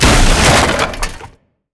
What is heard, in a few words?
record player smash